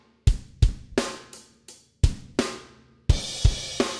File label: drums; musdb18